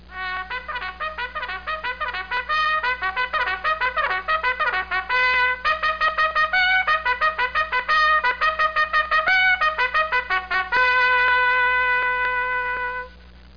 reveille

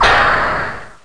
chink; Total Chaos Nukem 3d Pack; duke

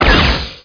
shot hit; MOTS

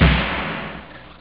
wood, drums snare; OctaMED SoundStudio V1

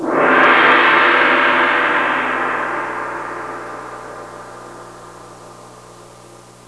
gong; Sound Effects for Multimedia - Volume 1

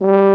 French Horn; cubic

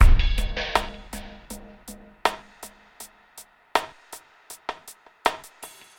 drum loop, ambient, 160; eJay

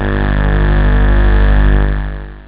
analog, synth

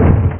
Slam; F1 Licenseware - Volume 1

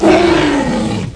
din; BERMUDA

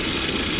flames; lander

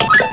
BUTTON

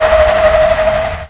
SPIN CEM

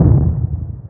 mortar

